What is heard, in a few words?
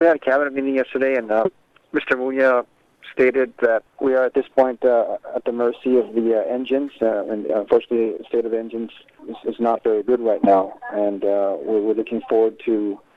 saipan one engine